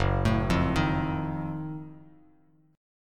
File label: F sharp Minor Major 13th down, Bass Standard; arpeggiated